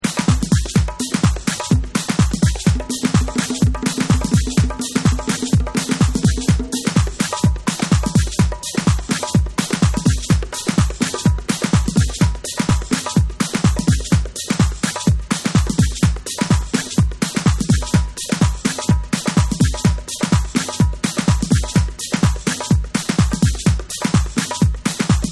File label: Philadelphia Jungle Drum